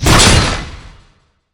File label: Fast Blow effect